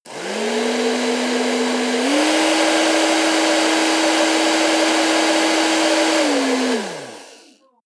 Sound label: hair dryer two stages; haus garten; badezimmer